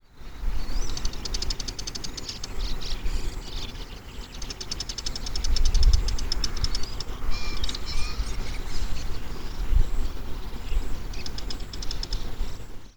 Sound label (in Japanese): mozu, bird song; モズ; 警戒音; モズ科; 新潟県新潟市北区 福島潟